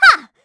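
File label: Vox Attack, Korean; Hilda; kingsraid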